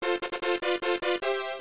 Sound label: Jingles; Count Duckula in No Sax Please We're Egyptian; Amiga